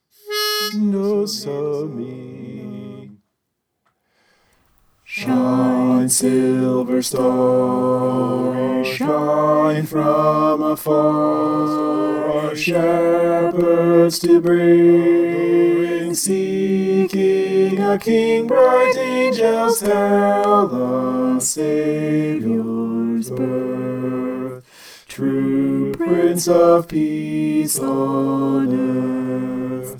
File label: soprano